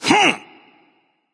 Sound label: grunt jump; smite; thor